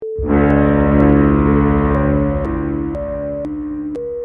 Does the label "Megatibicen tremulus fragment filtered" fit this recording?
No